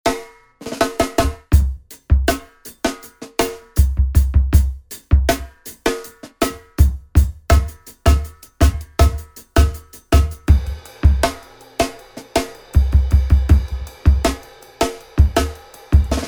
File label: soundtrack loops funk drums